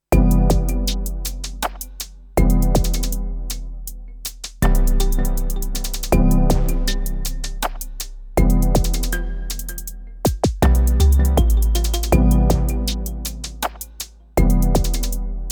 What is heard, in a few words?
Roland TR 8S Pattern